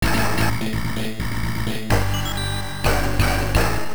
Level Complete; batman; nec turbografx 16

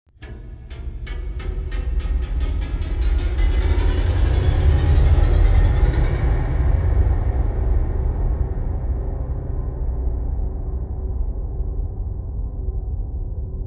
Piano Eerie Stinger; Layers of Fear Inheritance

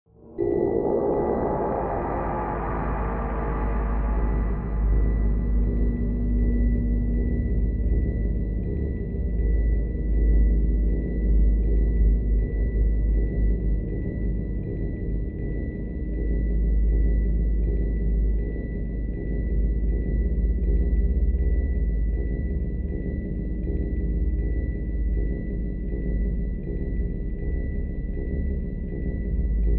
Alarm; watch dogs original soundtrack gamerip; Thanks for the Tip

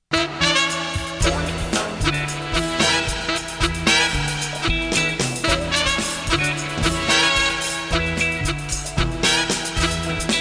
Funk, gamerip soundtrack; Backyard Hockey

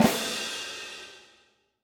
Drum Roll; Aster Tatariqus

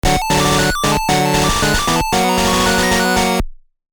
Level Complete; X-Men Wolverine's Rage

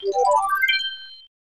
Stock Rise; Itadaki Street 2; SNES

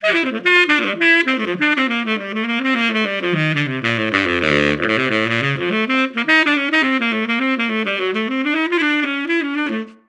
Tuba

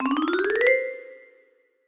Jingles; Bigcat

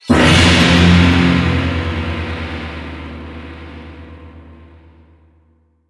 Jingle, player select

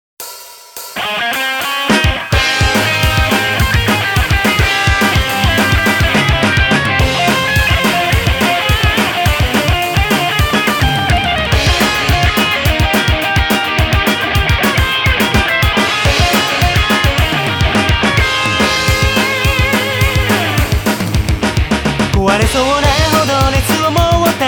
Bass Minus Track

